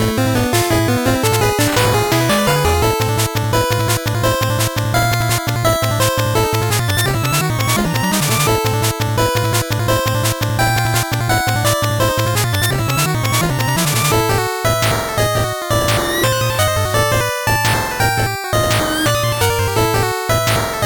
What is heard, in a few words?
8bit bgm fast